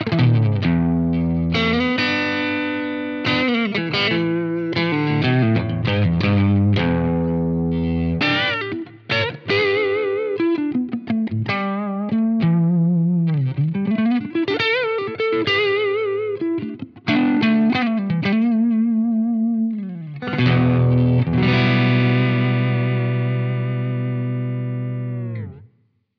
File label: Blackstar St. James Software Clean Breakup